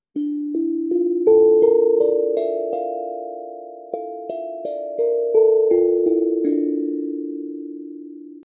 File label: Hapi Drum; Perc-melodisch